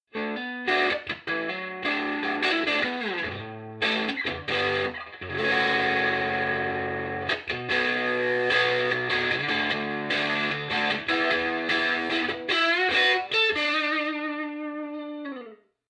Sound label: overdriven